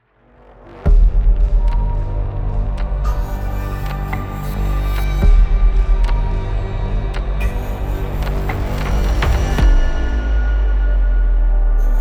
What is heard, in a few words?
scary horror trailer